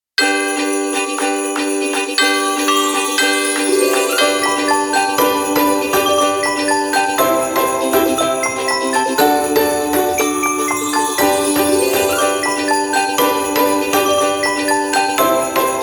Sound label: Jingle Bells